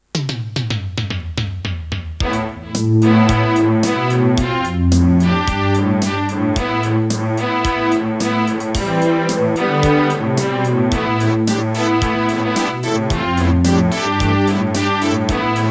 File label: AWE32; Raptor